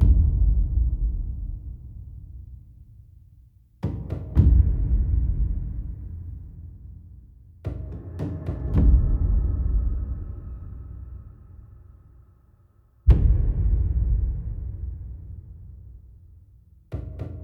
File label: music; 1-1 area 3